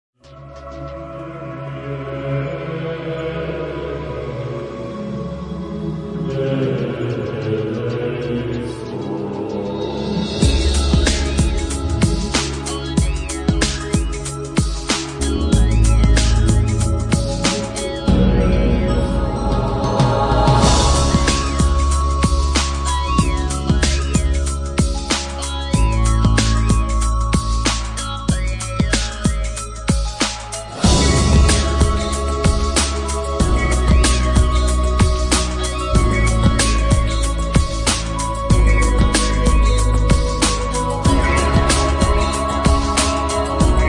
Groove Chant

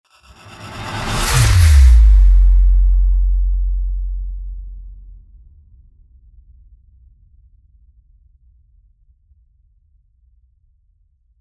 Impacts; Gothic Storm Music; Advanced Sound Design

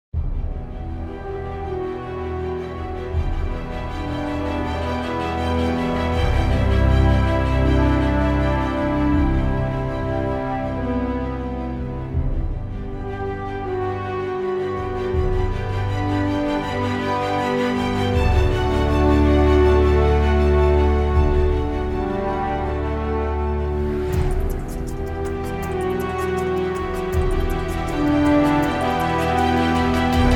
No Vocals, Epic Action & Adventure

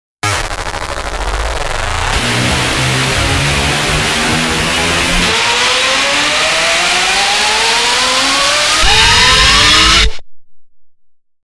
Morphing Rise; Ninja Tracks

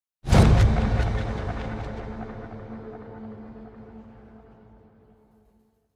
Slams; Gothic Storm Music

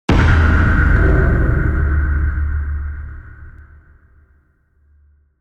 Halo Hit Deep; Ninja Tracks

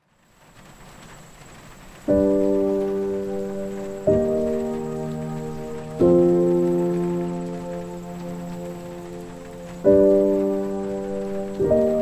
Spa Music (With Rain), Piano

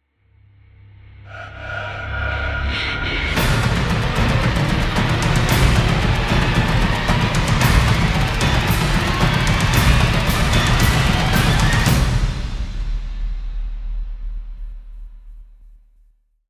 Jungle Warfare Riser, Percussion; Gothic Storm Music

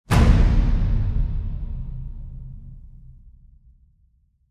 Horsemen Slam; Gothic Storm Music

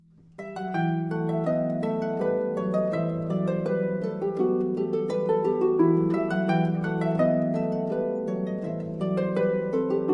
Lute Suite in e Minor BWV 996 Boureé

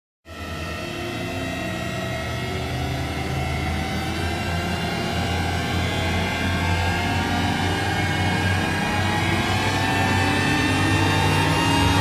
Rise; Ninja Tracks

